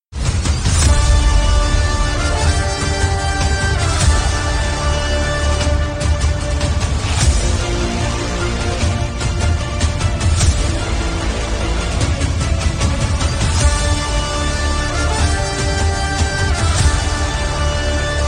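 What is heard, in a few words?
ENTRY background music ringtone; ROBERT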